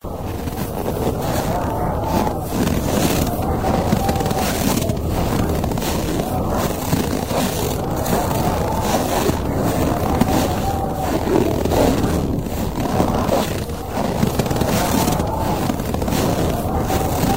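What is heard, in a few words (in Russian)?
звук для нового года хруст снега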